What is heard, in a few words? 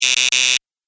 Gameshow Interrupt Buzzer Sound; Miscellaneous Electronic Sound Effects